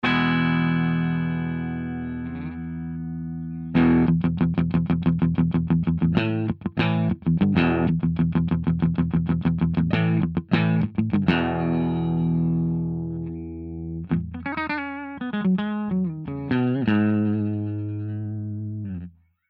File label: Reverb